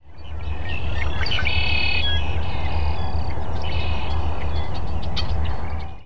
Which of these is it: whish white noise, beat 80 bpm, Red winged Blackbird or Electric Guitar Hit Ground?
Red winged Blackbird